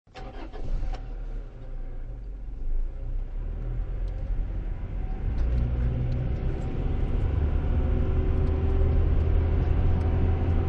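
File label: Car Interior Start And Drive Away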